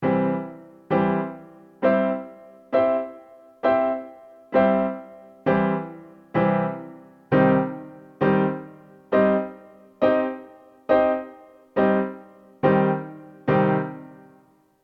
C and Cm block chord inversions

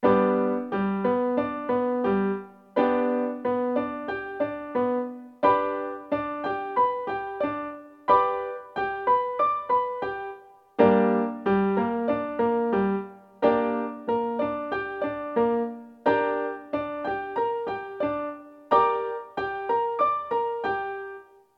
triad and inversion rhythm in G and Gm

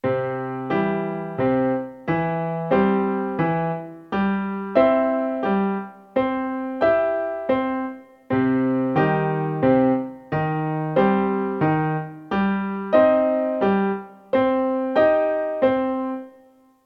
C and Cm

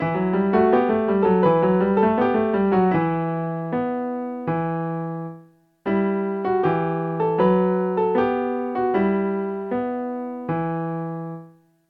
dotted 8th note in Em

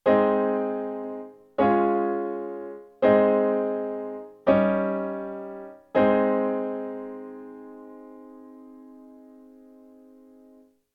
a flat major primary chords

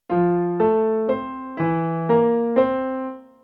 broken chord i v7

